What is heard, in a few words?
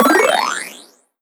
Perc FX Jackpot, 909; Slate; Vintage Drum Machines Volume 3 909 Edition